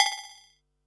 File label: HH, Pearl SC-40 Hihats and cymbals